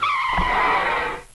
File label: skid; ICEBREAK